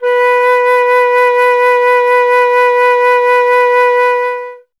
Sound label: FLT, Solo Instruments; Miroslav Vitous - Solo Instruments VOL-2